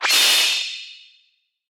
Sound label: Samurai parry windup; Fight Knight